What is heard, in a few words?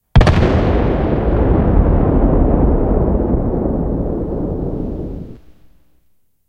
cannon 100 122 tail far; tanks; War Thunder